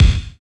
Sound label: KIK, Cheez Kiks; Club-50 - Foundations Roland